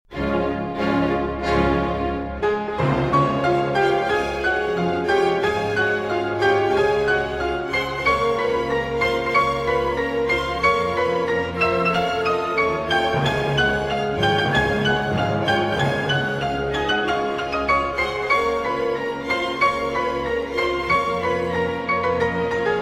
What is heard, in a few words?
Piano Concerto in D-Flat Major, Allegro Brioso